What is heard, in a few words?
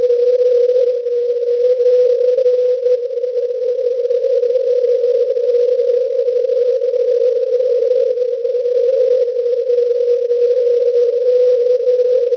cw